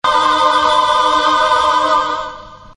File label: CHOIR